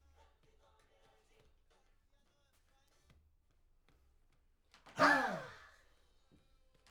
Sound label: Backs SCHOEPS